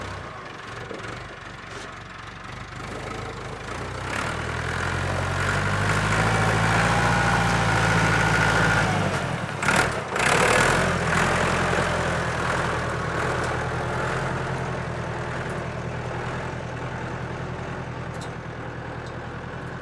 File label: grass tractor